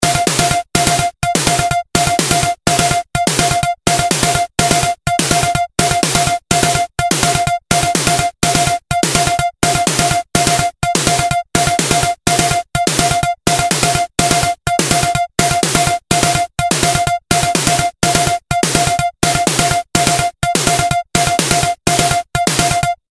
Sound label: jukebox song; server-nalc